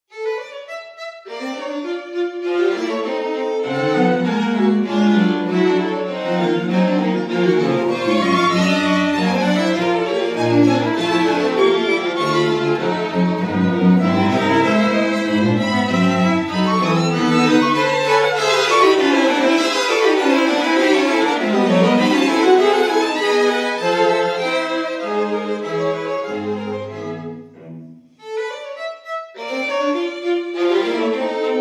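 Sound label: string quartet, Allegro giocoso; Cuatro Miniaturas para Cuartetos de Cuerdas; musica latinoamericana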